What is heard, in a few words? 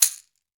CAXIXI, Latin Shakers